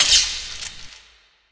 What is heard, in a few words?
spark, coop electric; player